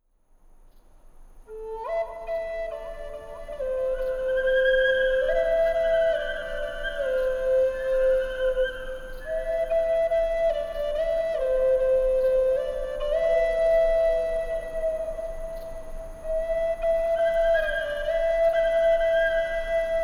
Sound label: Native American Flutes & Sounds of Nature